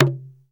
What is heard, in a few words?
SURUBA, African perc; Greytsounds VOL-1